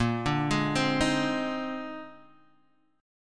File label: Bb 11th, Guitar, DADGAD